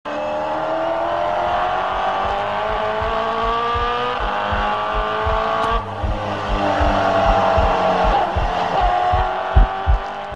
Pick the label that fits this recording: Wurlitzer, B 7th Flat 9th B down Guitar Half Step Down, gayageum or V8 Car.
V8 Car